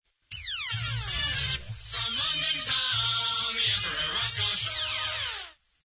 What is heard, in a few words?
jingle town; rosko